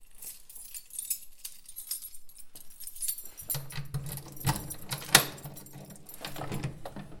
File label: opening a door with a metallic key